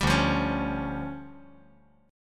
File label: D Minor Major 7th Double Flat 5th, down